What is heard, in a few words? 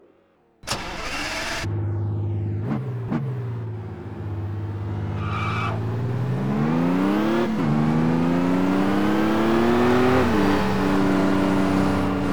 lamborghini gallardo coupe; TDU-1